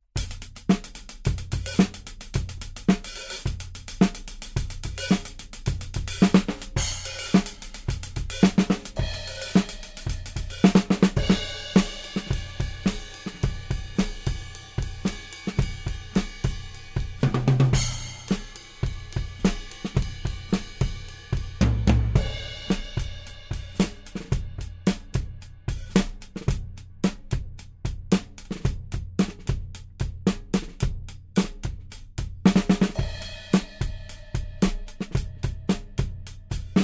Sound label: Drums OH